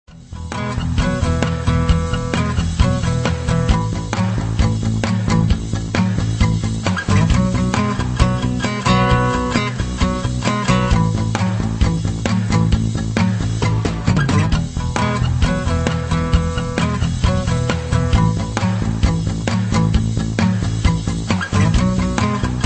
jam blues

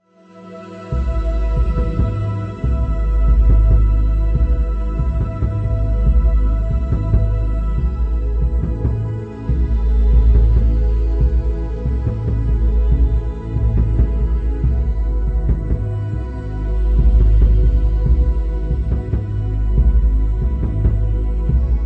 Ethereal Jazz Mood, loops

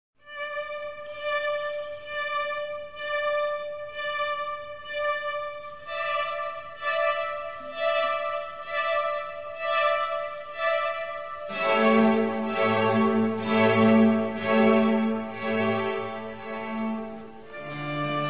Oboe Concerto Adagio; loops

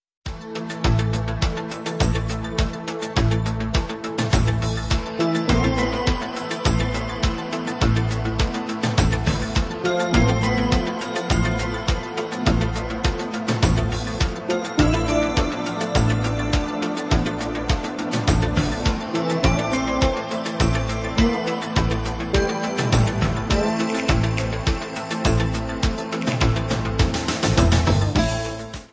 Latin Guitar; loops

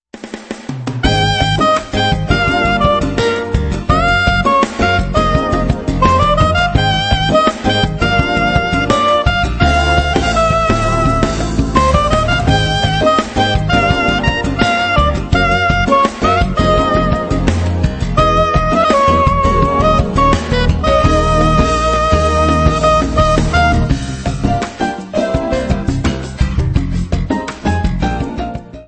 jazz jam; loops

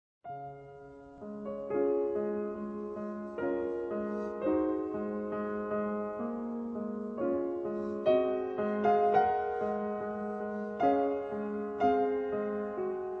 piano prelude; No 15 Raindrop

